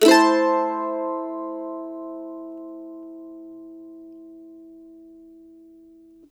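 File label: CEE CHORDS A minor, Blues Guitar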